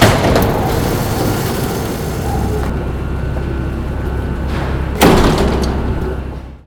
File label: Blastdoor; Risk of Rain